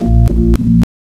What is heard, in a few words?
DRAWBAR ORGAN; ENSONIQ